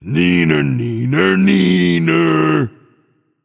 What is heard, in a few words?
tongue; chaac